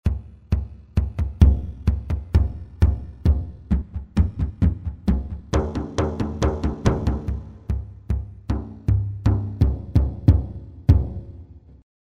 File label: Tungur, Asian Ethnic Sounds